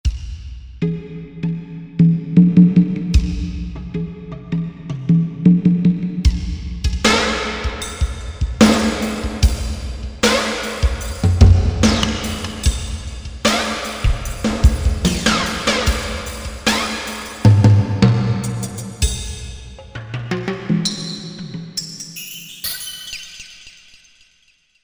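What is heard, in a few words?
Flange Drums; Korg MSC-8S Percussion; FlangeDrms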